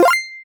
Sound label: pickup coin; videospiel; mcfly-show-videospiel